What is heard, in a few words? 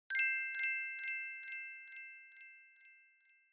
Glocken, High Delay